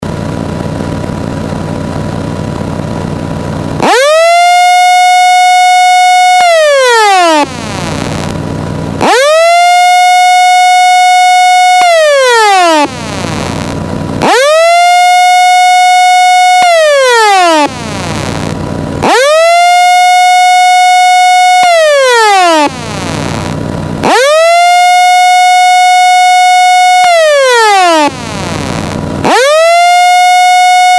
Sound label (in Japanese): ootsunami keihou